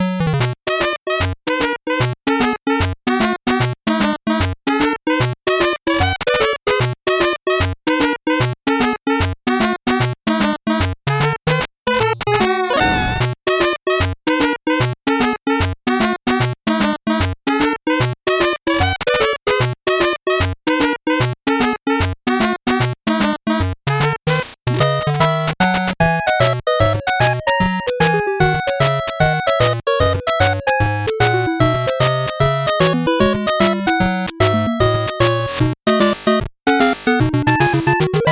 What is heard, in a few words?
8bit bgm slow